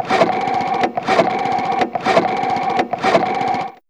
Dial, Fairlight CMI IIe

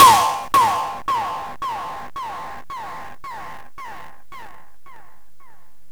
TOM DIS DIS 1 L, ELEC DRUM; USB Soundscan vol.20; Fresh Disco House I; AKAI

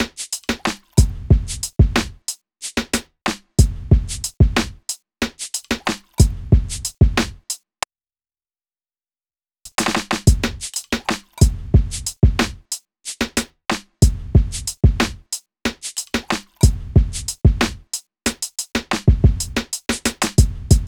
Drums, Hip Hop

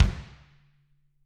BD, Drums; Bob Clearmountain Drums I

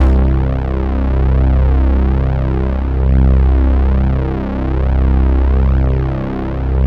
C1 prophet pad; Sound Gallery - Charged

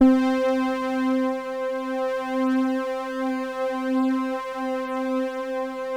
C4, ANALOG PLUG; Sound Gallery - Charged

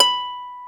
concert harp B4; InVision Interactive; Lightware VOL-1; Instruments & Percussions

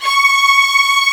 Strings F#; Best Service Gigapack 1

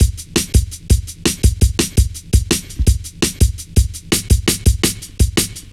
Fat Stepz, Drum Loops 167.5; Zero-G Jungle Warfare 1, 2 & 3

